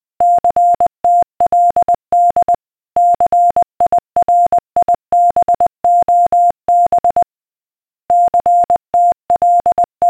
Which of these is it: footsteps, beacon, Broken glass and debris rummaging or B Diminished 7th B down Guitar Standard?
beacon